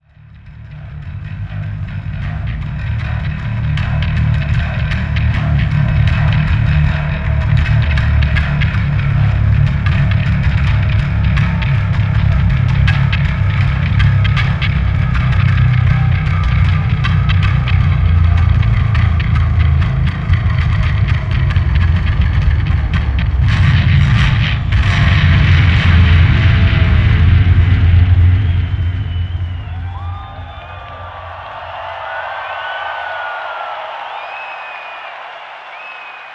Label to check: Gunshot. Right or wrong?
Wrong